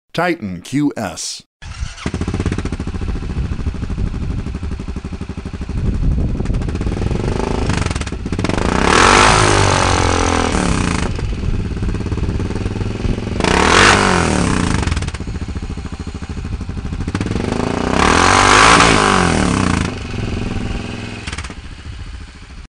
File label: pioneer sound clip